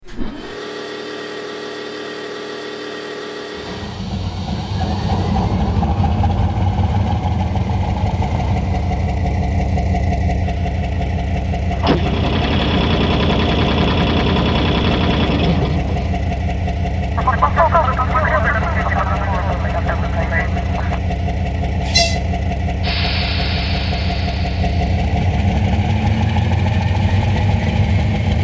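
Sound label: Diesel 2TE10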